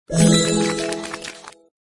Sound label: empty Line; word wipe